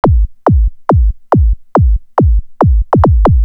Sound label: beat, party